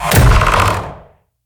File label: tiger punch